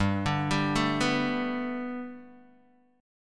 G Major Add 9th Guitar DADGAD, chord

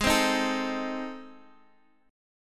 A Minor Major 7th Flat 5th

